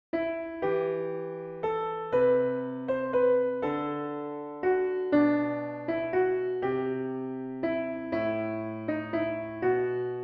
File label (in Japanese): ピアノ